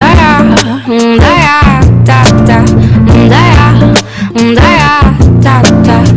song alto

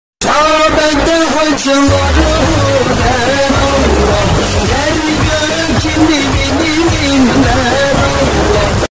100 bass boosted

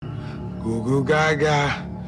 goo goo gaga

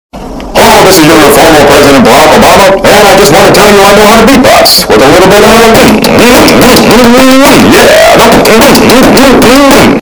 beatbox boosted; obama

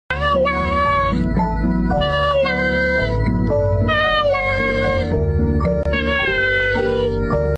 hello cat meme

